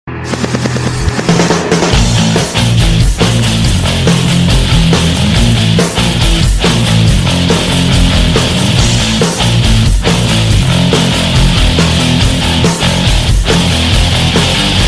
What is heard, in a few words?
pop ringtone